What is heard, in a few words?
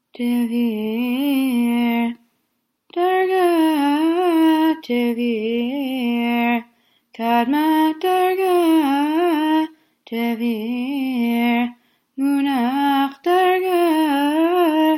polish lithuanian regalim תביר